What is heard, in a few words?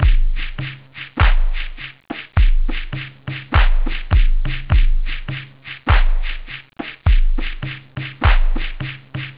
shaker, house; OctaMED SoundStudio V1